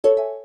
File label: key menu open close, keytone; Samsung SGH-T369 Linx